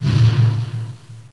Fire; Pokemon Essentials; Pokemon Opalo

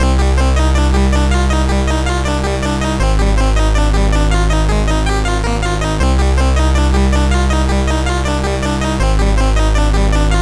Noise Channel Rocker, Chiptune

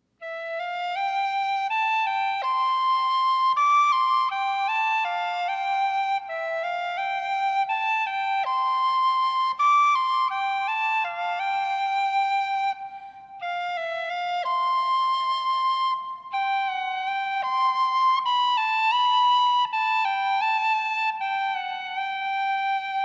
Pokeflauta; Pokemon Opalo; Pokemon Essentials

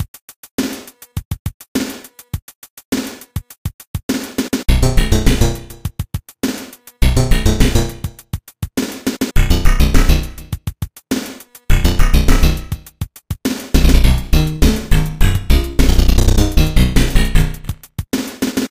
Underground, music; superbroswar

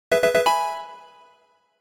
Pkmn move learnt; Pokemon Essentials; PKMN Estelar 1.3

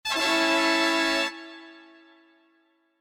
Tadaa!; Sonic OVAS

